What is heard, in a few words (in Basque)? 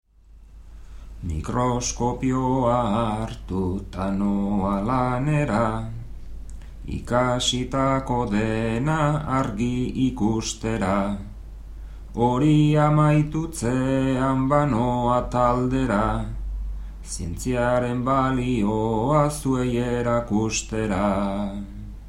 bertsos